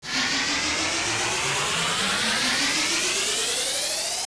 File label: Die; Ganon dying (stunned sound also plays repeatedly)